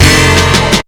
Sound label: shot; BEAT2000